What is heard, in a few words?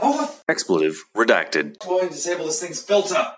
Filterline